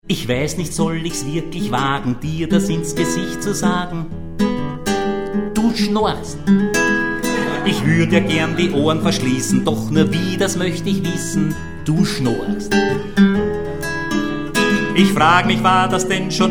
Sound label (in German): Schnarchen